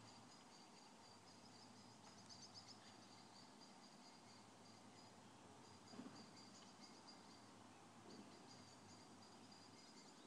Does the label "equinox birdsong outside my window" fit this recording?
Yes